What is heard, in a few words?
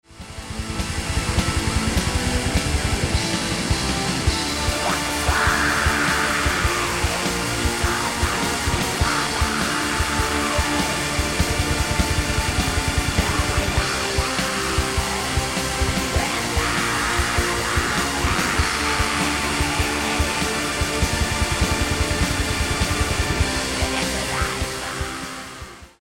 keyboards